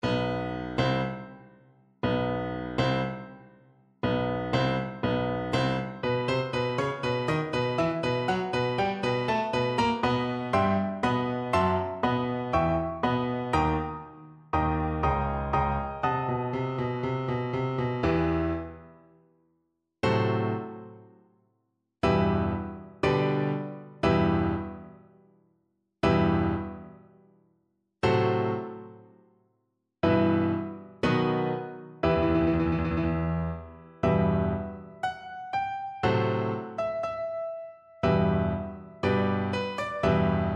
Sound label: Double Bass